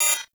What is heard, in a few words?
Ability Activate Error, UI HUD